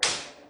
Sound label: Signal lever